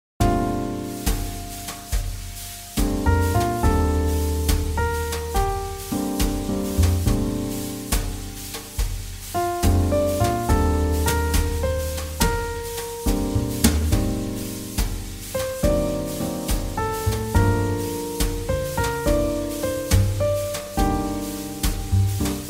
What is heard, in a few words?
JazzBallad; 잔잔